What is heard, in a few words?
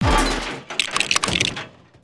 Metallic; destructibles